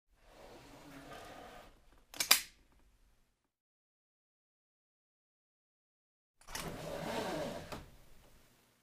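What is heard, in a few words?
car seat belt fastening unfastening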